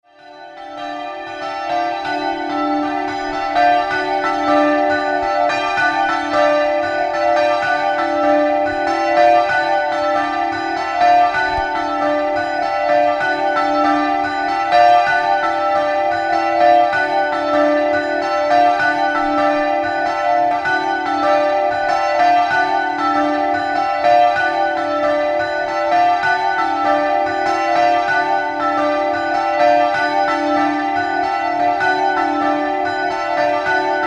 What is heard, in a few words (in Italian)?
Campane di Melarolo